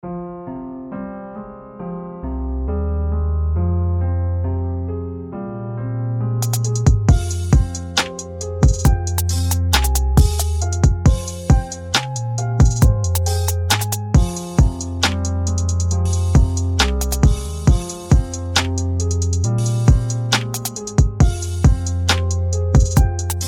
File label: trap beat mix